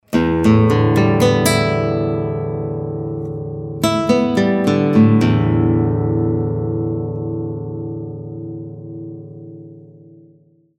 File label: Strings Down Up, guitar